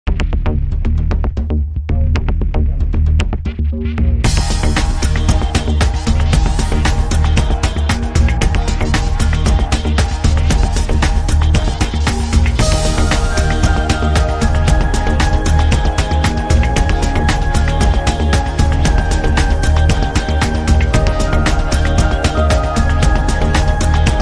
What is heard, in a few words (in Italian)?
Vibrazioni